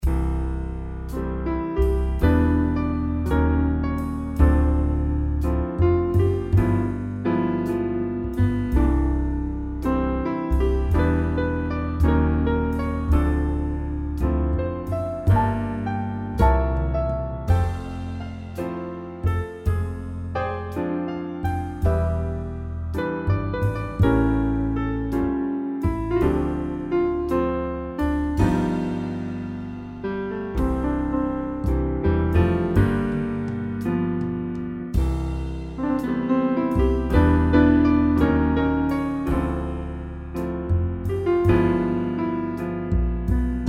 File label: jazz restaurant